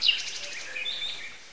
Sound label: Empty land; Caesar III